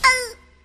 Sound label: ow paper catch; rikka